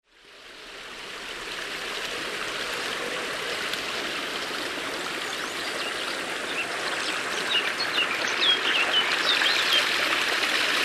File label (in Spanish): el torrente